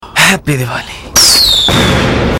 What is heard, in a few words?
Swoosh Rocket Diwali Special Ringtone; Diwali Special Ringtones